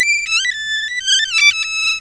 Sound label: Erhu 120 G; Sonic Foundry (Sony Creative Software); Crimson Blue and Fabulous Horncraft 4 RnB; Loop Samples; Opium